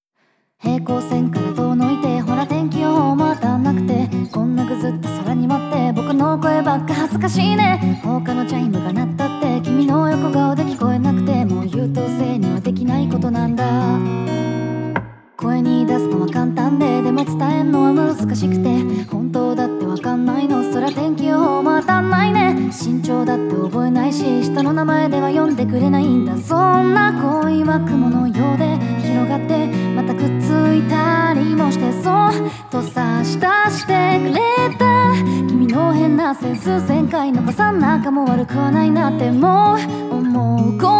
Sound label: Acoustic arrange